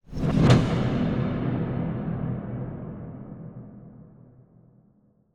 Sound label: sound design cinematic hit short build then hit